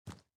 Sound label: SFX run rock; out of reach treasure royale 2020 gamerip pc